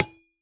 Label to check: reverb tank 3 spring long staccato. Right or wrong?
Wrong